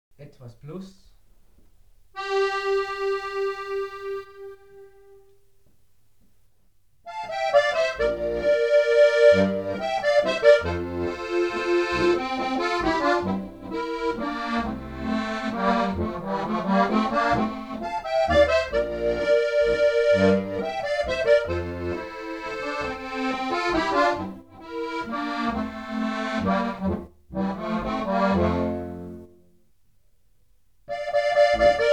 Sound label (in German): Tremolos, Solist etwas Plus